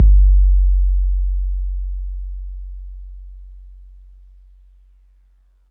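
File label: bass drum 808 MPC60 Nice F#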